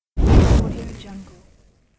fireball pass by

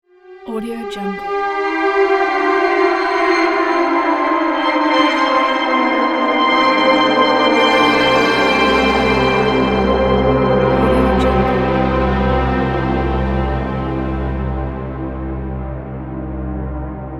horror sound collection

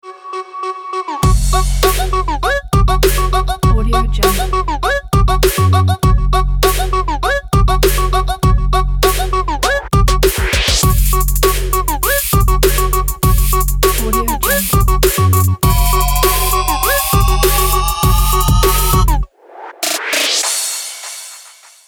trap powerful logo

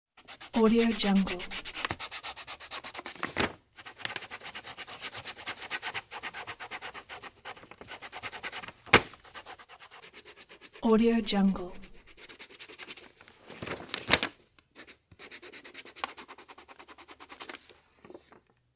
pencil

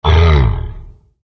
low dinosaur grunt

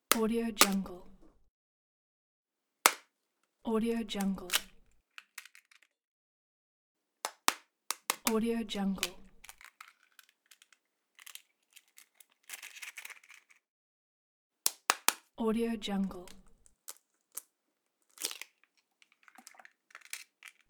egg breaking

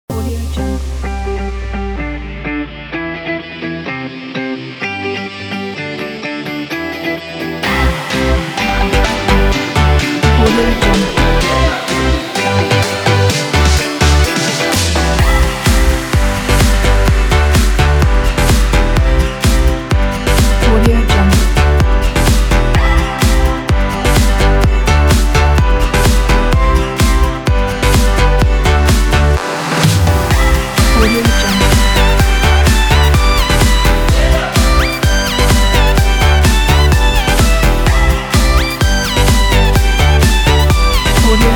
summer upbeat uplifting pop

